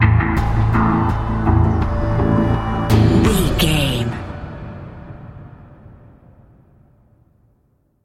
stressful tension stinger, Tension, ominous, dark, intrigue, suspense, mystery, danger, drama, dramatic, frightening, unpleasant